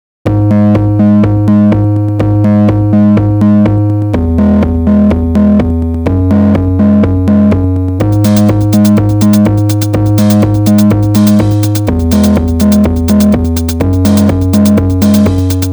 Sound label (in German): metronom test